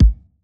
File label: kick